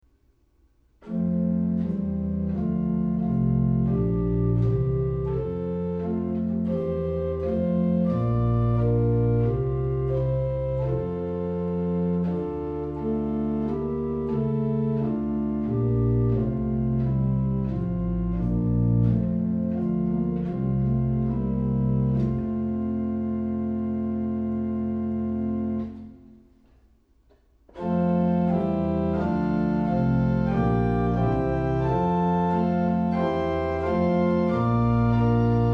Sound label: Hymn, organ; Stuttgart 1715